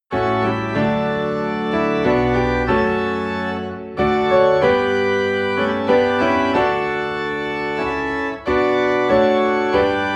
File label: Piano and Organ in D; The First Noel; christmas